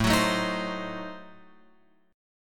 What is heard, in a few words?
A Diminished 7th, Guitar Open F; Adim7 strummed; x,4,6,4,6,7; JGuitar